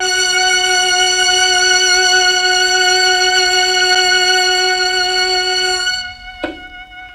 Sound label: MEL STR, Legendary M400